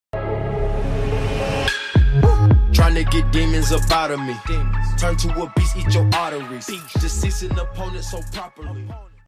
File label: follow sound alert sound and donation sound for twitch